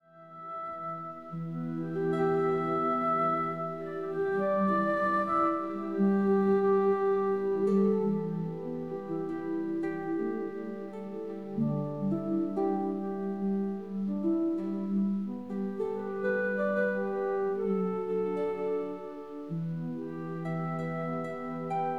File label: altered pulse code